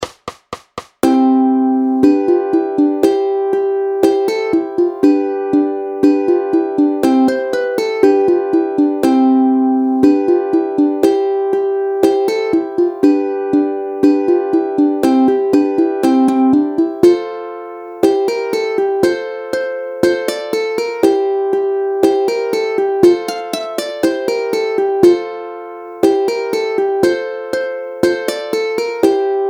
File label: Kankan, SuperUkuleleKlasika; Super Snadné Ukulele: Klasika pro samouky a začátečníky